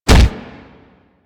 Fighting Blocked Impact; Warframe; Weapons

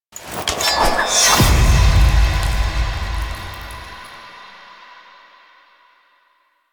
Head Temple Pickup Dual Swords; Ambience; Duviri; Warframe

